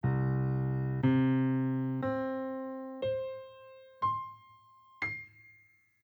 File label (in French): do octaves multiples